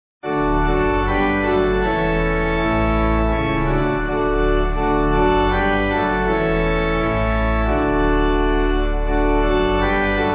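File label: pipe organ hymn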